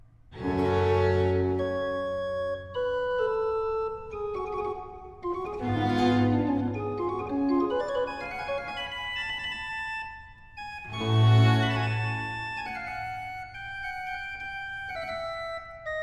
Concerto in B flat major Adagio e staccato, organo; Concerto no. 2; HWV 290; Haendel; Concerti per organo